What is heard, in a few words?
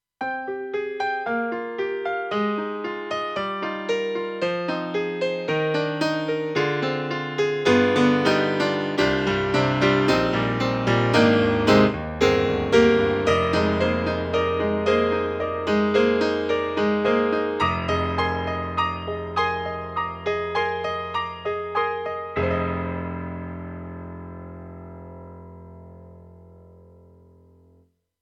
AKAI SG01p RICH GRAND